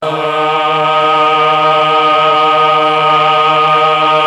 Melchod3, MelloChoir; Oldies

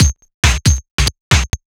Tech Funk, Synchro Funk, Beats; Sonic Foundry (Sony Creative Software)

